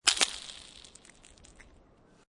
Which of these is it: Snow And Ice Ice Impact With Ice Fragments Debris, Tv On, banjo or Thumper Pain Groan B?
Snow And Ice Ice Impact With Ice Fragments Debris